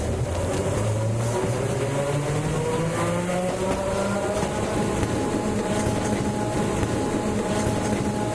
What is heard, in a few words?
streetcar go middle; tetsuon